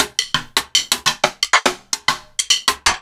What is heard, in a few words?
Sticks; Sliced